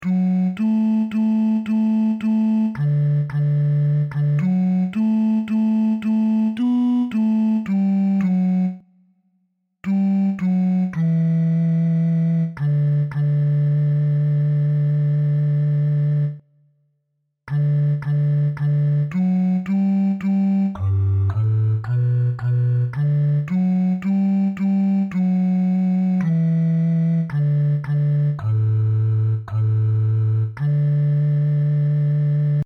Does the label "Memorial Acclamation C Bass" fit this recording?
Yes